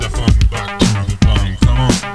downtempo

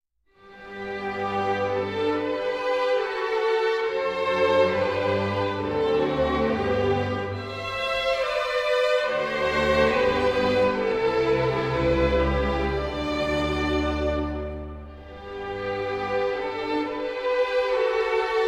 Deutschlandlied Violin

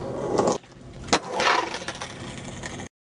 fall

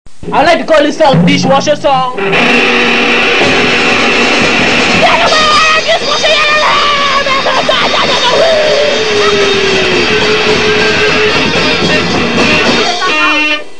Dishwasher Song

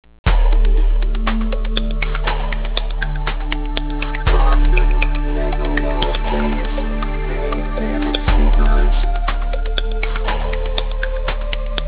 BASS

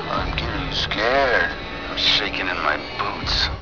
scared

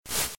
slap swipe 1

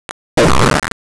fart

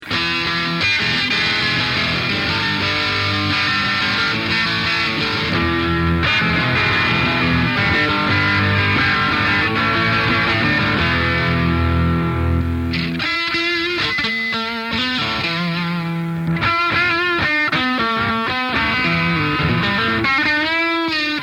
PLEXI

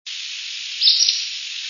flycatcher acadian; birdsongs

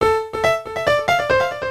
piano

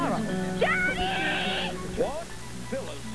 SCREAM

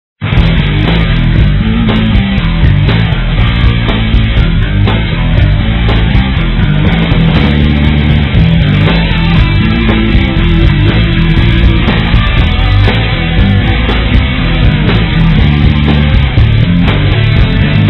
jazz

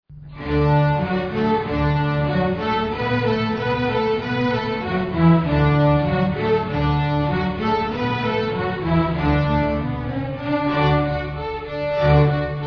string swing

